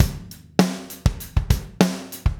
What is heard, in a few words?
100 Groovin' Drums; Pop Rock; Moderate Tempo; Pro Tools Essential Loops; Digidesign